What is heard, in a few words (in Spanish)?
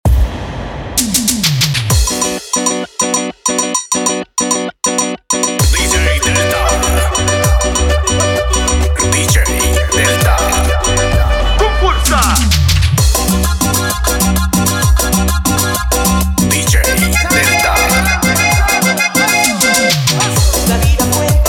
Entrada